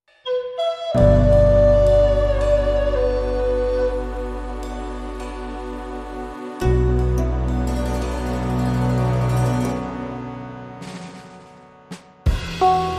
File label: Fleet Flute